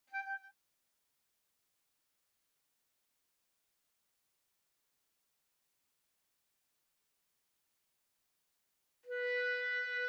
Clarinet; Holst Jupiter

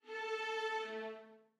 Dance of the Reed Pipes Violins 2; Nutcracker Suite

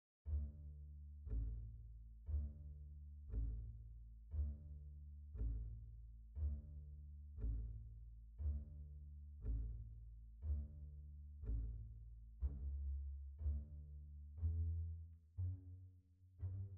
Double Basses; Nutcracker Suite

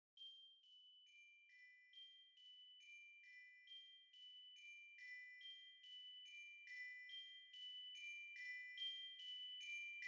Glockenspiel

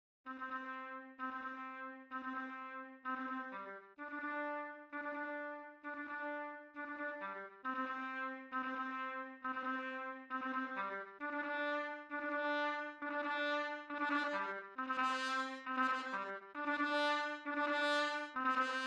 Trumpet; Overture or Something

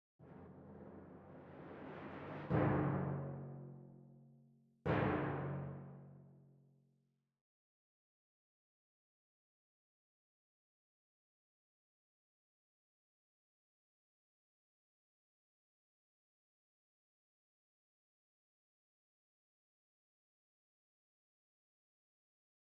Timpani

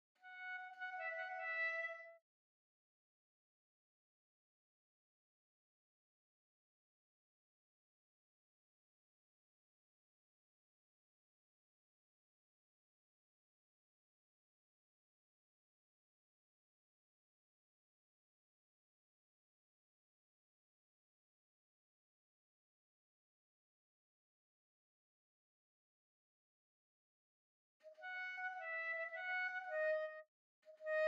Clarinet

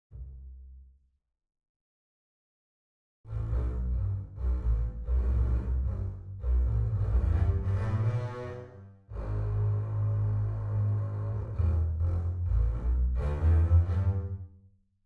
Double Basses; Holst Jupiter

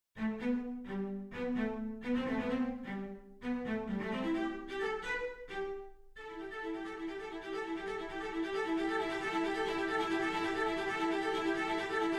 Cellos

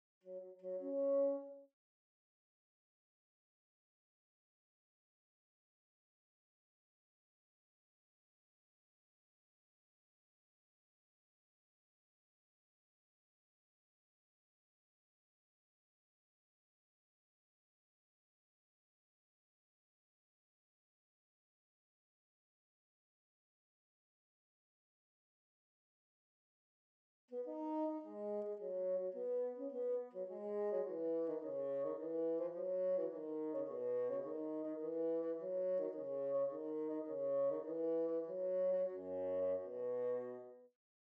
Bassoon

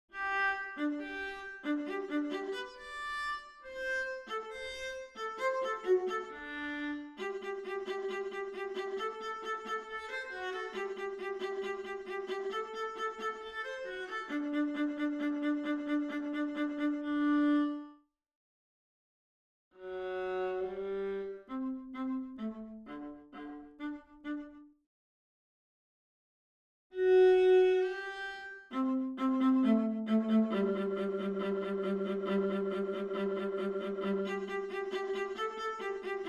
Viola; Eine Klein Nachtmusik prepared for ctools by Shegolev

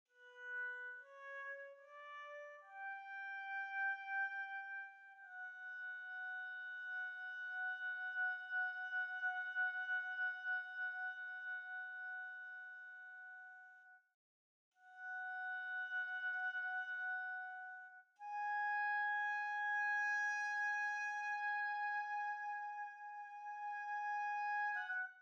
Oboe

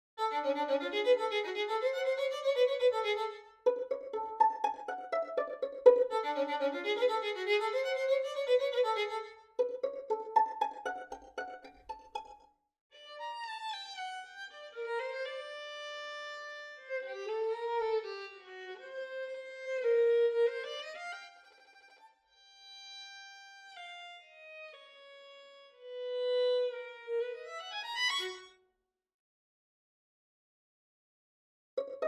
Violin; Rondo

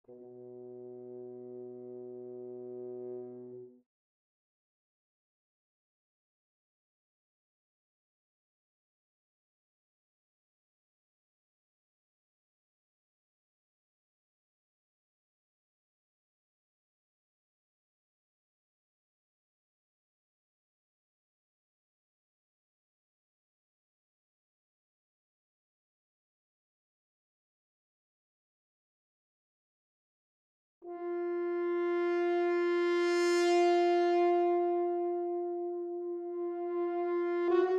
Horn

